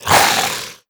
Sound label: bold hiss, headcrab; creatures; headcrab classic; hideout